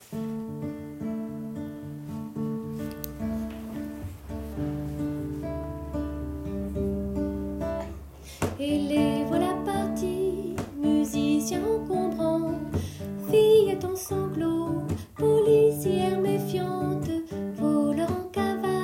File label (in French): guitare chant; En voiture; musique en voiture